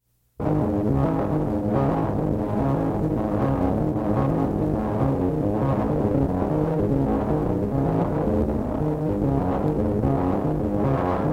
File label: Romanian distorted solo sax